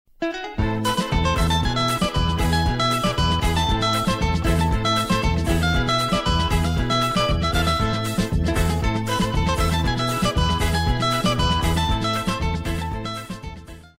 cavaquinho mini; instrumentos

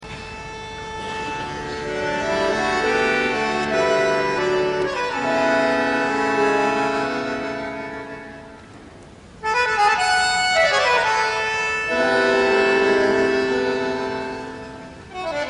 erro bandoneon; instrumentos

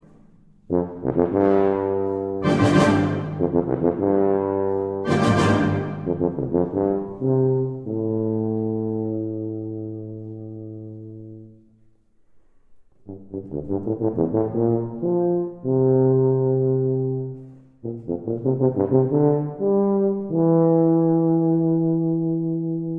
tuba

